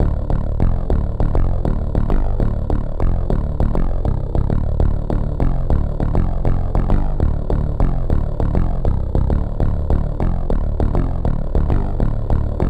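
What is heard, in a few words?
100BPM D minor Bass Funk; BRM Vol2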